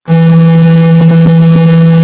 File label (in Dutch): snaar 6E; tonen